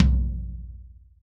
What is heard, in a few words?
mid tom a sharp key; tom drum